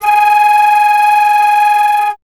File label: flute G#; Miroslav Vitous - Solo Instruments VOL-2; Solo lnst. II